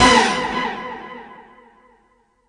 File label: strictly disco, hits; RoBKTA Sample Pack Supreme